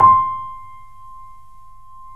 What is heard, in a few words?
3D Boesendorfer; E-MU Producer Series Vol. 5; 3-D Audio Collection